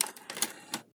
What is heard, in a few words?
tape recorder opening; Tape Recorder Opening and Closing